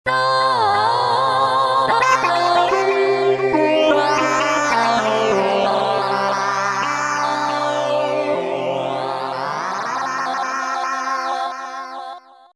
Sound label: Castrato, V-Synth